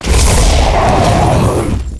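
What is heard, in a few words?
spawn; Claudell01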